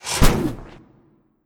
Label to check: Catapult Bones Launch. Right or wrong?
Right